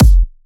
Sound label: kick